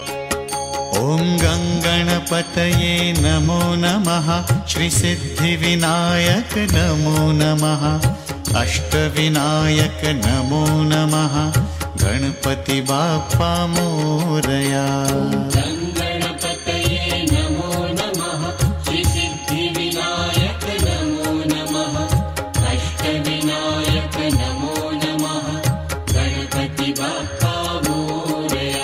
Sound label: Om Ganesh Mantra